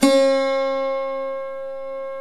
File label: SAROD